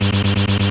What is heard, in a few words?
Shock